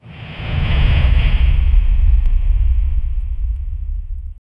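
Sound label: heavy pounding dinosaur, roar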